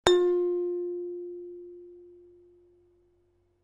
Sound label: large plate soft rubber hammer; bellplates